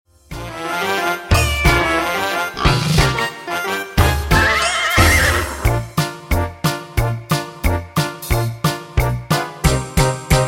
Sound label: Karaoke